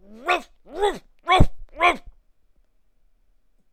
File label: dog